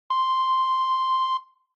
Countdown

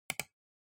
PC Mouse L

